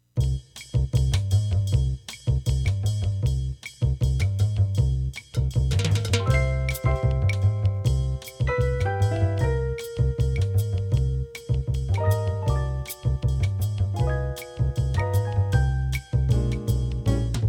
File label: trio, Jazz Band